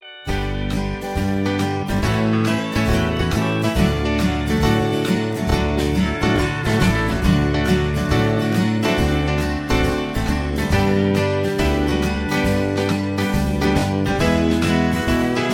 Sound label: High Key